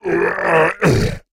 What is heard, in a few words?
Creatures Fight Death; Mighty Party; Android